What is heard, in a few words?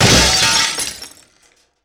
Window Glass Shattered